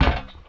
metal grate, footsteps; player; Dystopia

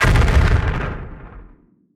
explode; Dystopia

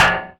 solid metal impact, Bullet Impact; Dystopia; physics

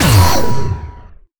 emp, empgrenade; weapons; Dystopia

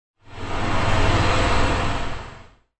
distant noise; Dystopia; fortress

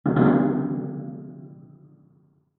metal creak; Dystopia; injection

